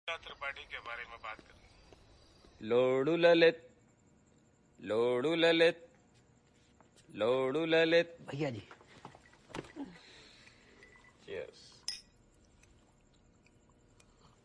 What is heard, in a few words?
Tamil Ringtone